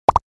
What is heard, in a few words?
Funny pop up